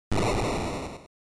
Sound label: General Noise Single